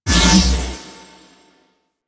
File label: Foot Switch Press; Ambience; Warframe; Orokin Moon